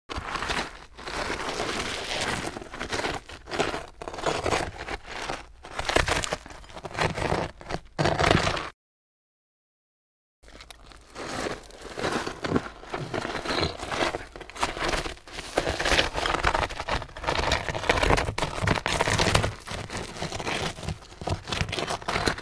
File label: drag a big stone